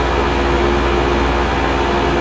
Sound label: train engine idle; Garry's Mod; tf2